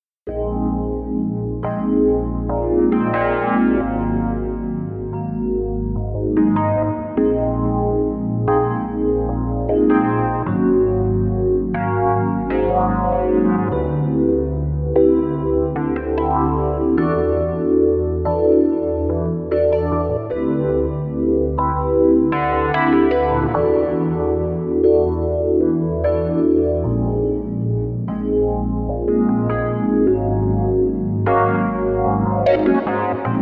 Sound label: Chords Effects; Lounge Lizard; Spundj